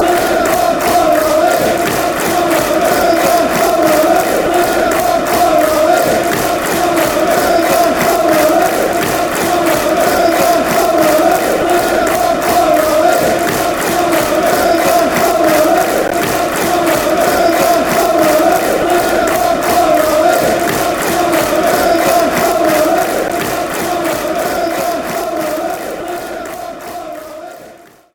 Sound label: barmy army, fanchants; kevin bonds